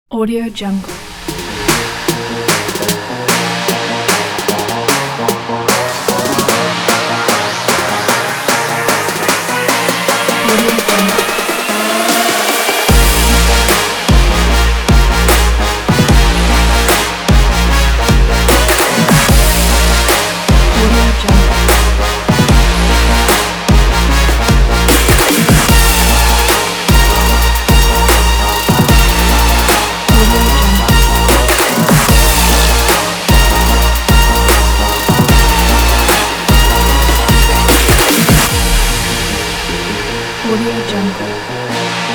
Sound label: trap; seven-pounds